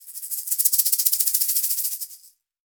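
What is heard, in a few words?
shaker egg, percussion; ILIO; Synclavier Percussion 2; World & Orchestral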